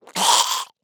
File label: zombie